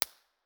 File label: koli snow, koli national park winter; 1 way mono